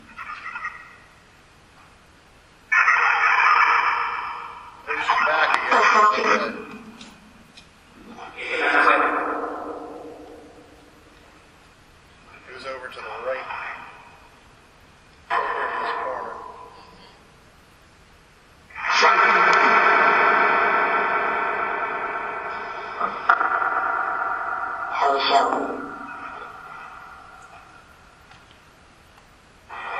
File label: Phasma Box; beaver area high school investigation